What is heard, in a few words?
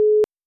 error